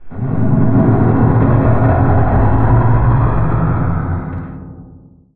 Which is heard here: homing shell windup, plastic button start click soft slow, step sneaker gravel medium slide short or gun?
homing shell windup